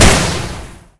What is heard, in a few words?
laser rifle mono; Barotraumatic